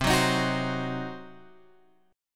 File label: C Diminished C, Guitar Open G